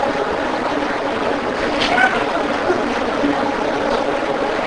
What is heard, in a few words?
Unusual noise; red brick tavern